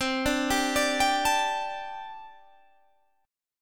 D Suspended 4th C, guitar; Dsus/C; arpeggiated

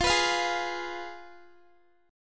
F Diminished Guitar; x,20,21,22,20,x; 4E,4A,5D,5G,5C,6F; strummed

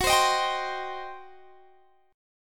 G Major 7th Suspended 4th Sharp 5th Gb

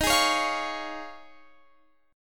Db Major Eb

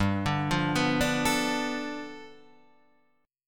G 9th, Guitar Half Step Down

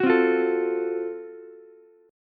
Gb Minor Major 7th Flat 5th, Ukulele; strummed; 11,12,13,12

